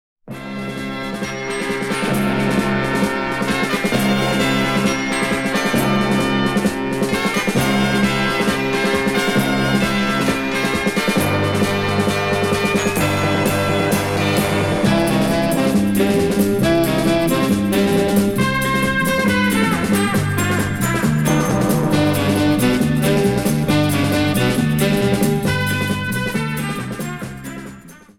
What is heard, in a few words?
Big Band